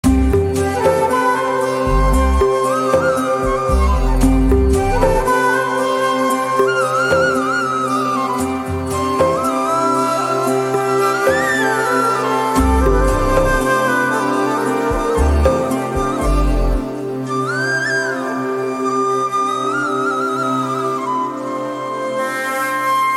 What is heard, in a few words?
Flute BGM